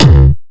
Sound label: bass kick